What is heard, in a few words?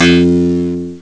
bass guitar; Amiga Soundtracker Sample Packs